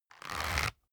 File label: leather creak short medium